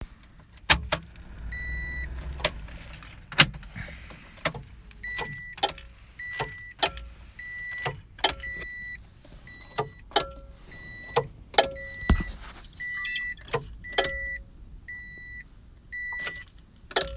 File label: civic vx wont start all i get dink donk sound; mechanical problems